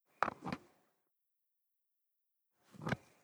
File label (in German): Blutdruckmessgeraet Schlauch rein raus